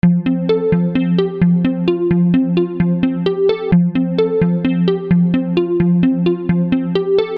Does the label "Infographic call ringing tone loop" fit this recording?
Yes